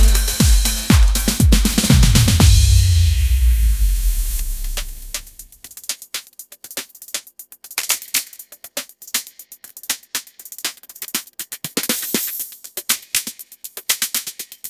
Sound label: sunshine groove anthem, ringtones